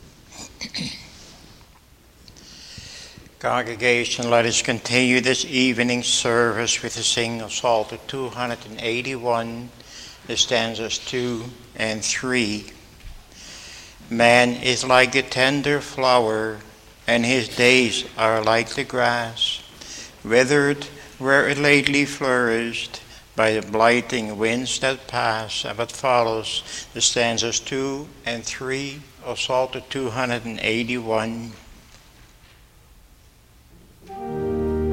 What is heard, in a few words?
Singing